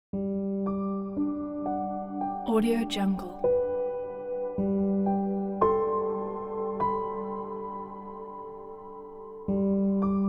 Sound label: meditative piano improvisation